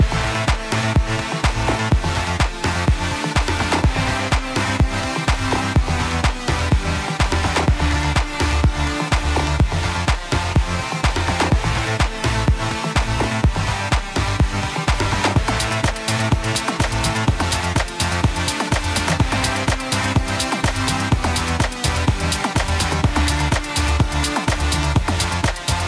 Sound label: techno remix